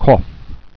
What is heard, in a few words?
cough